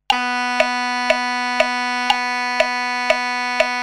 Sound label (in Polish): dziura ab1, saksofon